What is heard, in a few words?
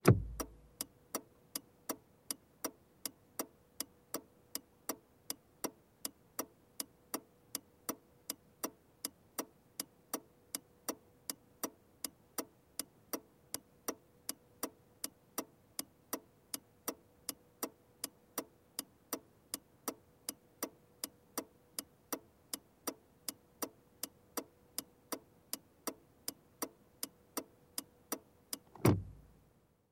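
car turn signal sounds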